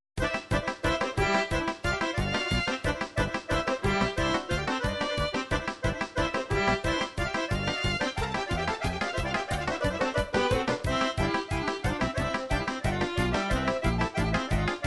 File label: folk srbije kolo, midi